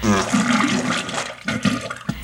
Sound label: shitting; Farts